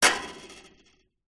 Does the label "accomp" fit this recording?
No